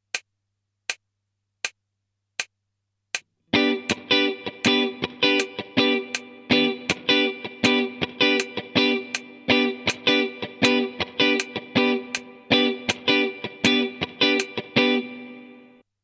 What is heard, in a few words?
funky rhythm c